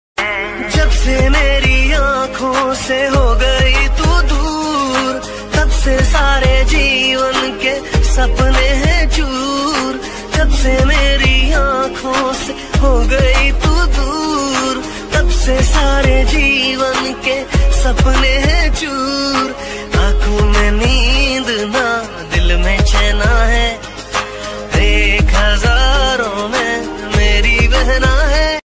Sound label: Rakhi Song Special Ringtone